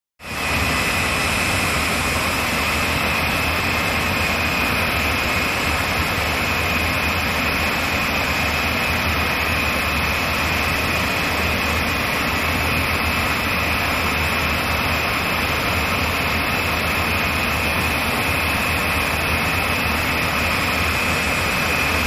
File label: Medium Idle, Steady, Slow Engine Rev Followed By Combustion And Exhaust Sounds Reflecting, Deep Jet Rev And Away. Close To Medium Perspective. Jet.; gnat